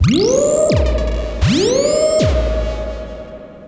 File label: 2600 Arps Verb Vinyl Synths C2; vinyl fx